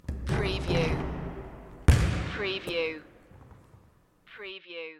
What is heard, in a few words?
BBall Miss Shot